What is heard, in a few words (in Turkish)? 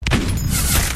sniper sesi; sefkat tepe sungurlar